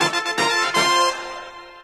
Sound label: Baseball Jingle Happy Short; Home Run High